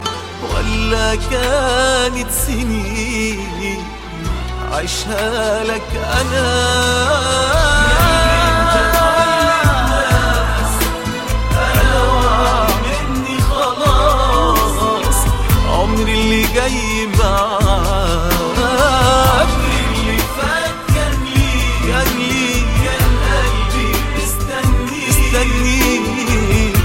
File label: love song arab